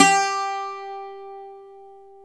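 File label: Bouzouki G 2, Mediteranean Traditions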